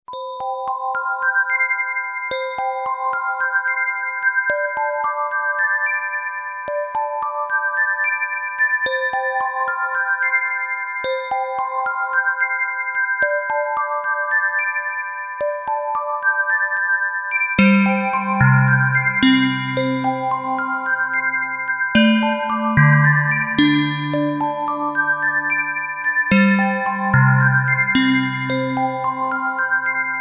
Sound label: MUSIC BOX